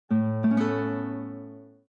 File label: Windows Logon Sound